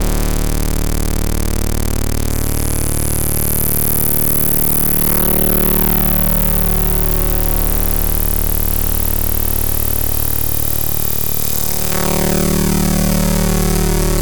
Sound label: Signal Interference